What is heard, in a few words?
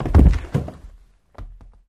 Body Fall On Linoleum; bodyfalls